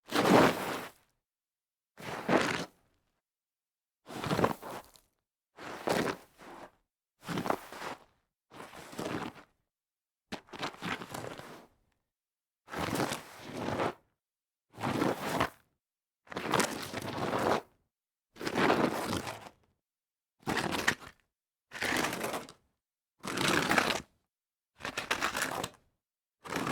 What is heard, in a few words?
slide on dirt series